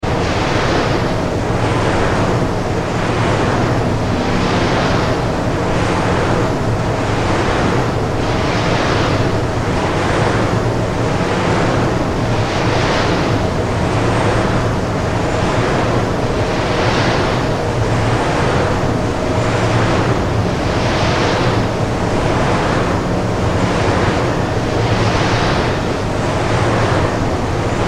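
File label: sounds of a wind turbine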